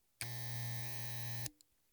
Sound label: electric shaver short on off switch click